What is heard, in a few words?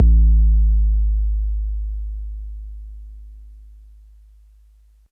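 bass drum 808 MPC60 Noise G#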